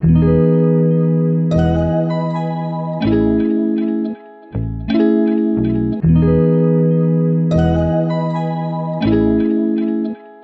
80 BPM E Maj; Oceanside; Gems Free Teaser Pack

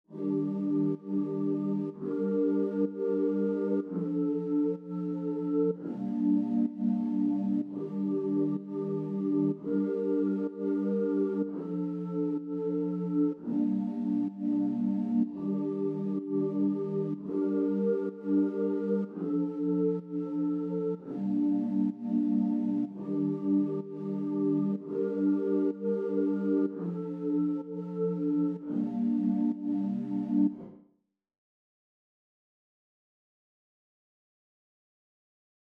126 BPM G Min Pad, Hip Hop; Melody Loops; Loop Stems; Cymatics; Cielo

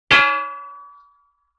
Tire Rim Hit, Vintage Cartoon; Drums & Percussion

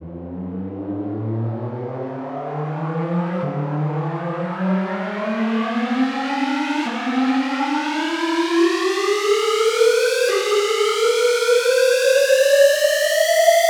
Riser Siren; Riddim; One-Shots; SFX; Ghosthack; Riddim Essentials Volume 2

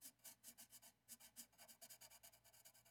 Drawing Small Tiny Pencil; Fairlight Sound Lib

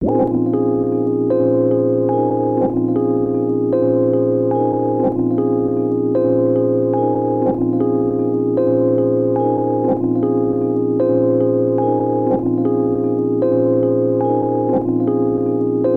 Tape Loop Melody - A#; Tape Loops; Melodies; Cymatics - Eternity Sample Pack

